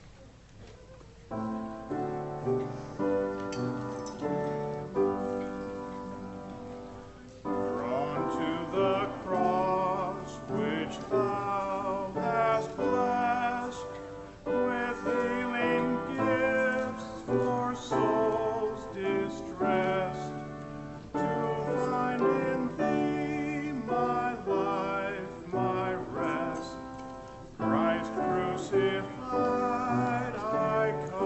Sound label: Solo